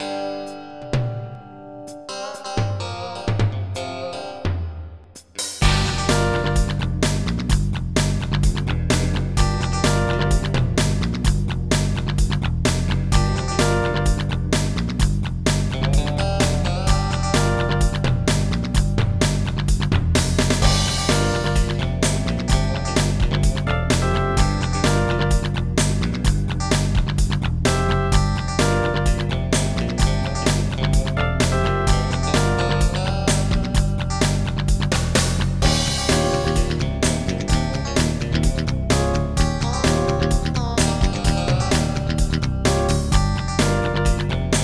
rock pop; gmplay

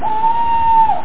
OWL

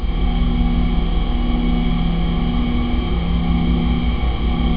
ENG ROOM; PC Gamer IT CD 32; XCOM; Gadgets

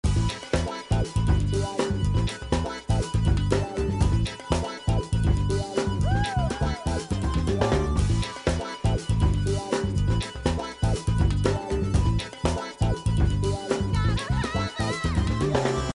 funk softer; scoobykickinit

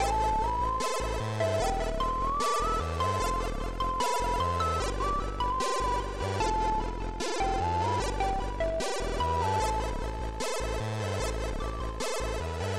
tune

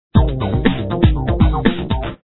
loop; winter challenge

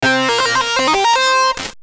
WEAP AMMO; Quake

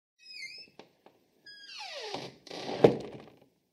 cart Door Close; Keepsake

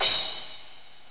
Crash Cymbal; GMPlay; Drums; TG300B

